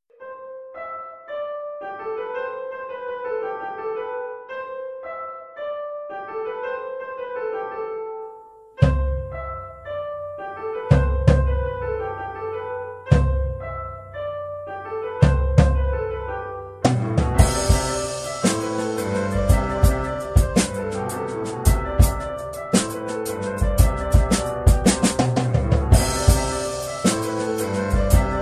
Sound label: drumming sessions